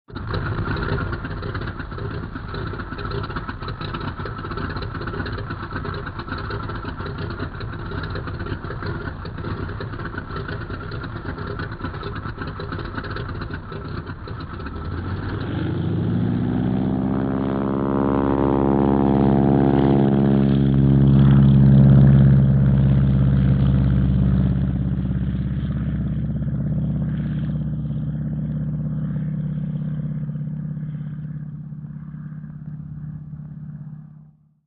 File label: WWII - AIRCRAFT PROP FIGHTER: EXT: Idle, engine splutter, accelerate, take off.; ww2 fighters; Older recording.